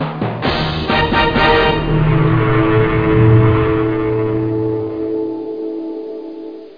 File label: Opening Sting; Let's Discover Pennsylvania!